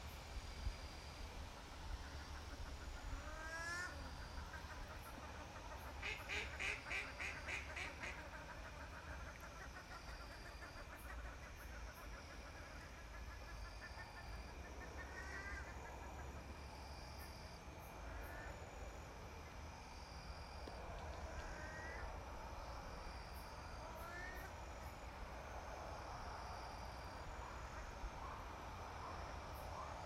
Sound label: The Gong sounds